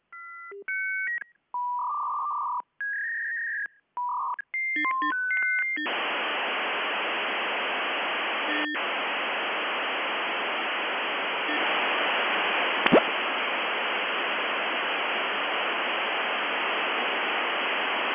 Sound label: line signals